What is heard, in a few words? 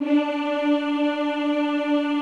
D3, Classical Choir; Best Service; Extended Classical Choir